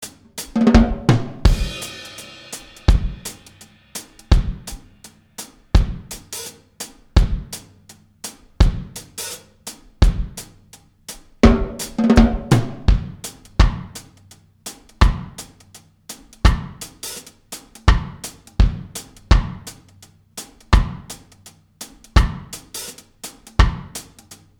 Drums Only

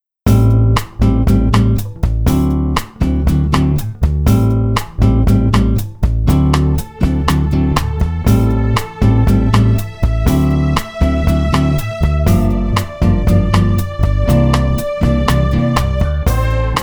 Bossa 120

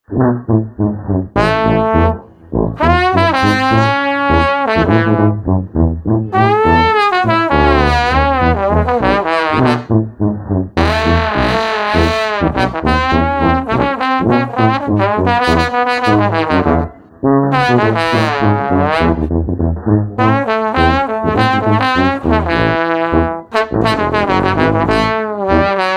Street Tuba Trombone Bouncy 102

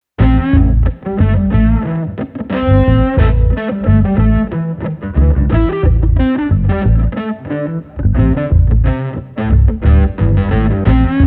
Guitar Bass Disto Lounge 90

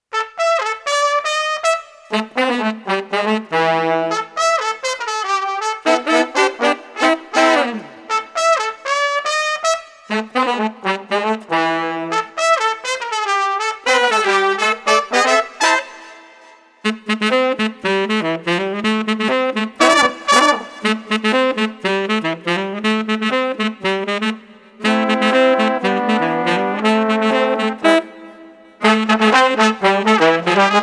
Street Brass Marching Crew no Drums 120

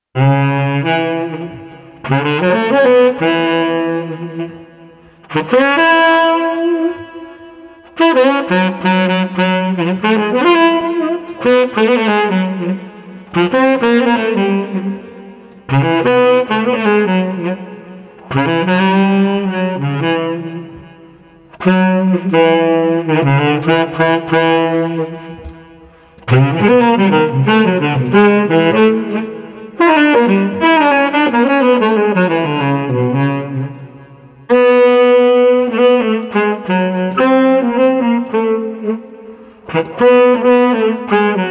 Street Sax Gracious 90

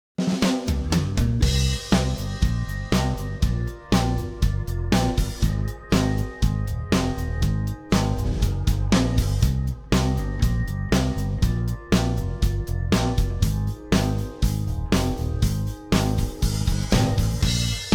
Underscore 120

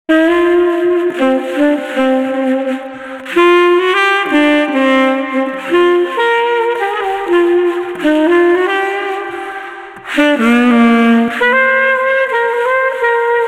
Street Sax Loungey 86

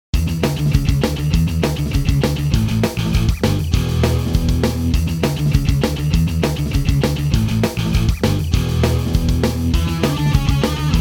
Pop Punk Instr

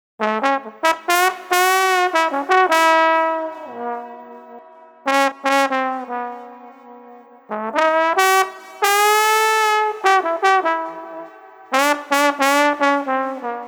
Trombone Blues Melody 90

